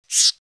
bird death; The Binding of Isaac; SFX Monsters

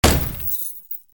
Lock Break; SFX Environment; The Binding of Isaac

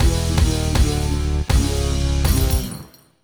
Lose; leisure suit larry magna cum laude

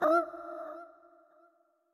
Toxic Mask Hurt

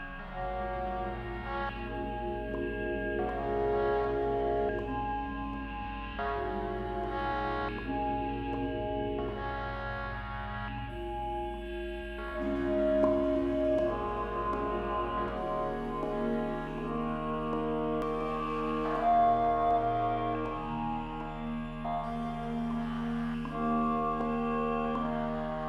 arpeggio; 0°N 0°W

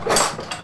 Use

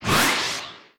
Enemy Wind; disney star smash android ios mobile gamerip 2020

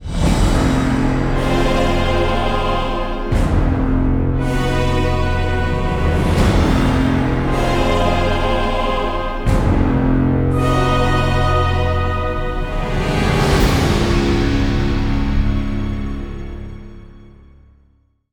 Fanfares, mus ladder ending

